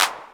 Clap

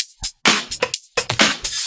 Electro Loop 128BPM; Vengeance Samples

